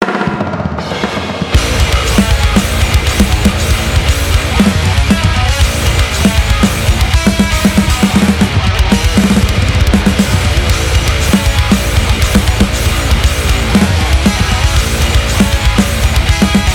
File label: Instrumental